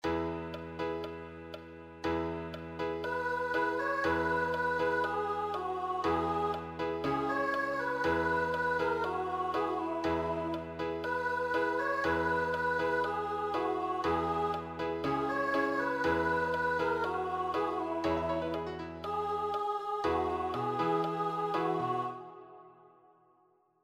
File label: accompaniment, piano